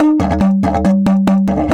EF 140, DIDGE RIFFS

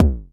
Tech bd, Jomox Airbase99

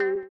VIBRA WAH, guitar; Spectrasonics; Bizarre Guitar

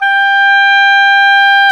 oboe; Roland LCDP04 Orchestral Winds; Oboe Brth 1

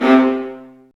STR, strings; Roland - LA Composer vol. 1

